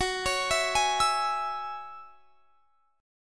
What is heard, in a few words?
Gb 7th Suspended 2nd Gb; Listen to Gb7sus2 arpeggiated